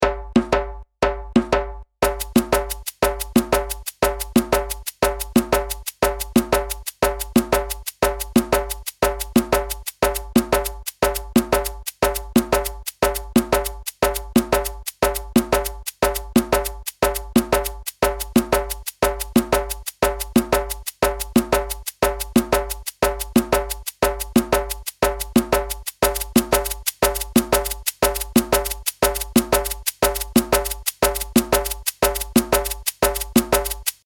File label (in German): Pulsation 12er gerade und u, djembe